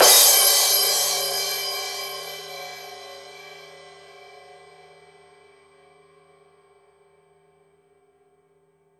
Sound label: crash cym; Drums Percussion; Drum Bass; Zero-G; Total Drum Bass